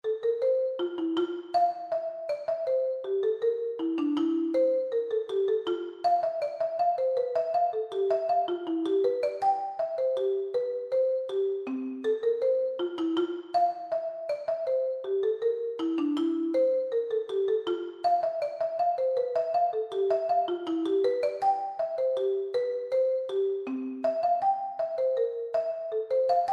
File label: bach bourree, percussion